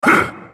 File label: effort; GatekeeperGalio; Character Voices - English